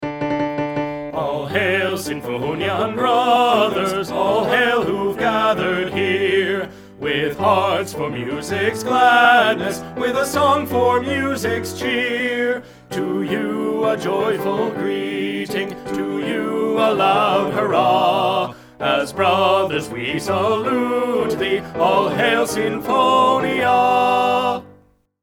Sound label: A Sinfonia Anthem Bari loud